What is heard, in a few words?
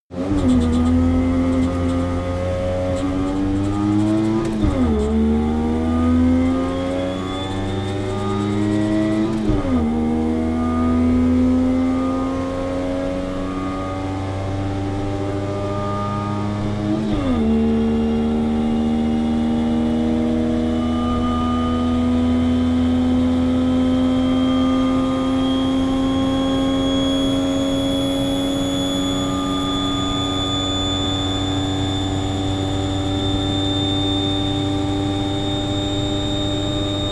car saab 92 accelerate interior